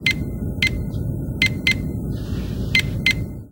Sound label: helm beep sequence, computer